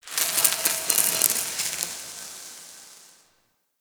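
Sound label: Ice Sizzle Random Ambience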